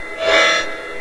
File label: alarm; XDM; ambience